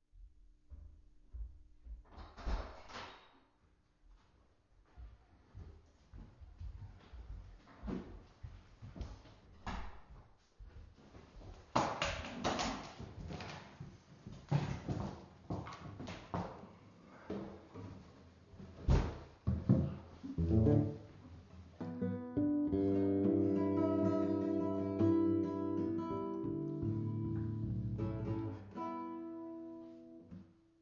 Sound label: entrance and tuning; Binaural Dummy Head Recording